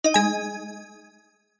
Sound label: multimedia game sound mobile game warm notification event tone